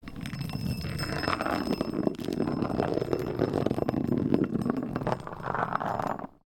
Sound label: metallic ball rolling on concrete; sonniss gdc